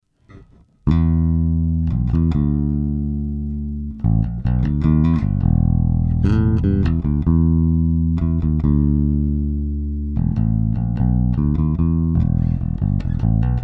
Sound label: Block 800b Jbass treble